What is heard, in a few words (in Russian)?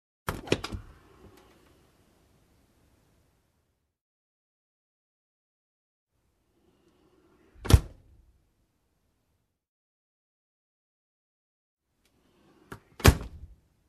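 старый холодильник открывают закрывают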